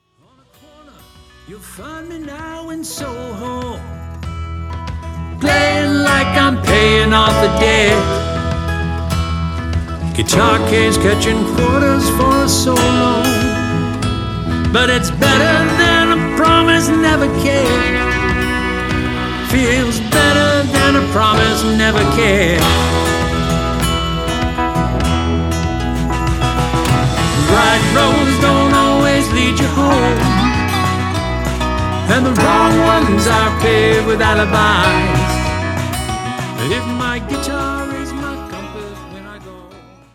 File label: Guitar